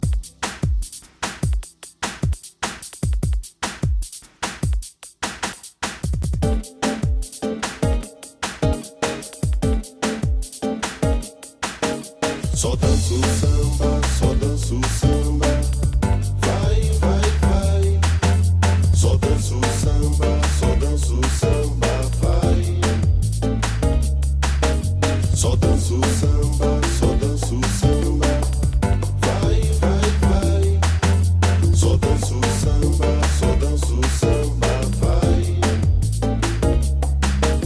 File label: Drum n Samba; electronica